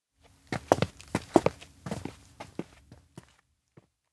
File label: steps outdoor observatory exit; awayuki; anemoi